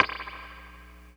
REGGAE GT, Funk Gtr & Wah; PlatinumPhattCD2; E-MU Producer Series Vol. 8 – Platinum Phatt

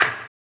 HAMBONE, Vocal Blues Soul; Spectrasonics Vocal Planet

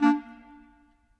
Clarinets, Orchestral; Sonic Foundry (Sony Creative Software); Orchestral VOL-2; Modern 22

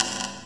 THISTLE; Rarefaction; Poke in The Ear With A Sharp Stick

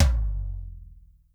REAL T1; Crypton - DrumBase5000; R70 KIT #1

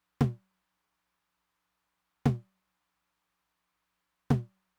mid tom with, Roland TR-909; drum machine; Roland TR-909 Ableton Project

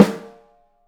OPEN BRASS, 3D Drums; 3D Sound

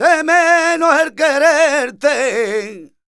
voc f, Flamenco Vocals Male Cantaor

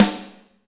Snare, Alesis DM5

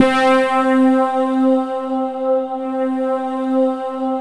SY77 BRAS, PAD; Alpine Volksmusik

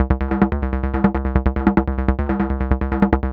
Bass; Sonic Foundry (Sony Creative Software); Machine Language

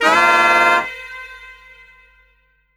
SAX, 150 BPM; Ueberschall; Drum & Bass Resonance

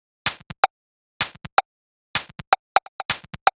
Pacapaca Top 127bpm, House Drumloops; 90 s Sample CDs; Zero-G House Fabrik Drumloops; ZG Drumloops

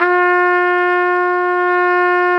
Solo Brass, Tpt p, RCA

